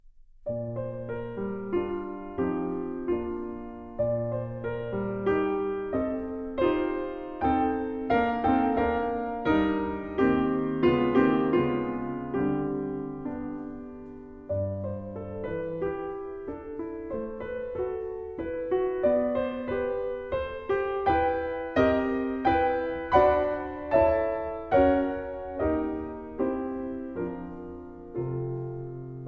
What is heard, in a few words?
Dumka For Piano; Martinů